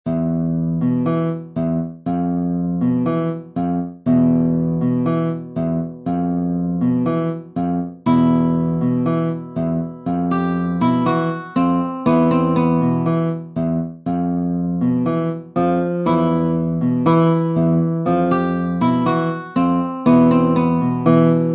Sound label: tango; kowal michaela; rozva; serene tango